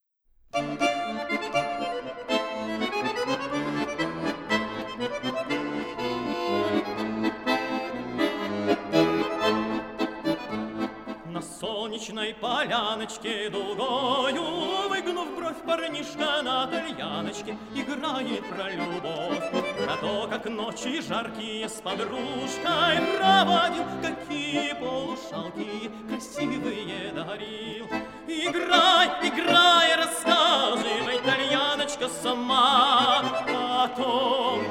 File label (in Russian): Хор Валаамского монастыря